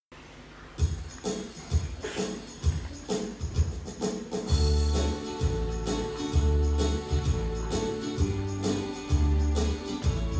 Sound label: Happy Birthday Jesus EYFS